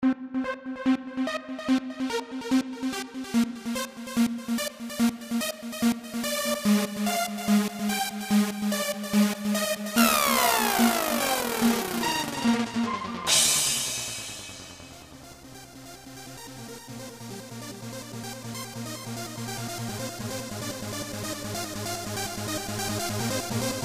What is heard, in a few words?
Trance; Trance Tonight